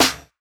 Snare, jungle breaks drum sounds

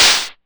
Noise Clap, DIGITAL KIT

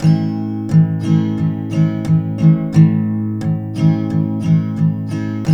TK MJ A#2, Guitars; Spectrasonics; Hans Zimmer Guitars VOL-1

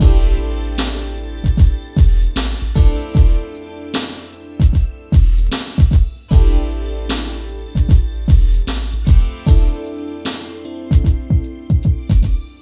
Hip Hop and R&B Drumloops; Best Service; ProSamples vol.01; AKAI

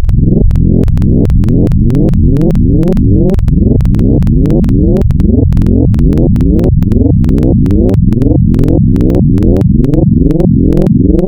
FM Dual, wavetables, Frequency Modulation; Architecture 32

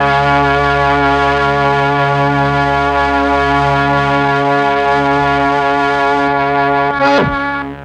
MEL STRING; ILIO; Propeller Island

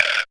SR STICK, SR-16 DRUMS; EdgeSounds; Drum Mashines VOL-1

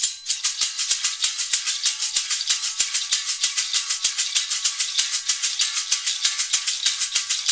PERC METAL; Roland LCDP14 Africa VOL-2; BTS Af.Prc.Loops

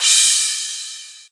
Crash, Cymbals

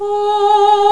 VOICE & BELL

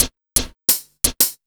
DRMSND, Pure Electro, BEATKIT; USB Soundscan vol.03; AKAI

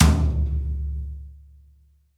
New Brush Tom, Roland BK-5

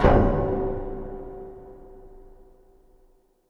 big clank

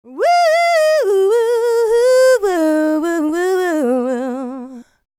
licks, vocal, dance; ILIO; Vocal Planet

